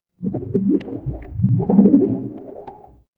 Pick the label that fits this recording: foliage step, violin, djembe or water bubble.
water bubble